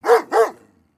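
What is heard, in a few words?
Dog german shepherd barking and snarling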